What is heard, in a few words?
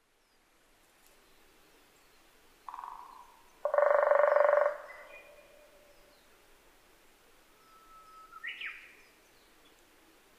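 kera sp, mole cricket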